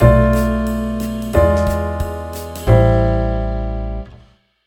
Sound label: sub II m minor 2 5 1